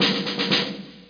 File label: Snare Phrase; 11,025 sample rate; SpecOps2MPlayerDemo; Music